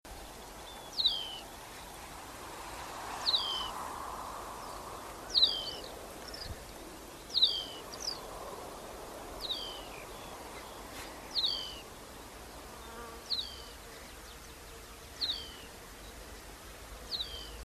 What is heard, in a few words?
Tawny Pipit Anthus campestris